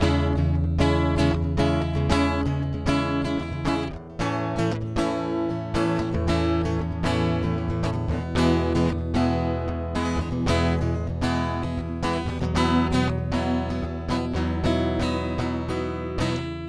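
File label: guitar riff; loopable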